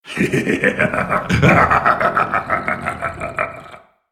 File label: laugh; Skarner